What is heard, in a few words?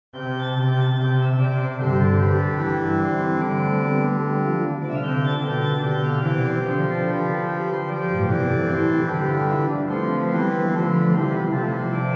Noel, Organ Music, Souvigny; Boely